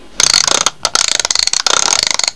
wind

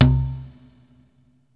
BONK

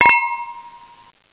GLASS

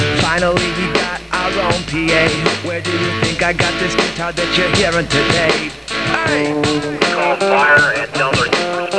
guitar

